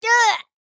A Hurt; Harley

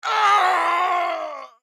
Pain Death; Black Adam

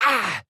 Hurt; Fern

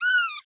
Hurt; T&J Jerry

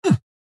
AHurt

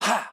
Attack Small; Batman

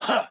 Attack Small; Batman

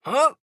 Jump

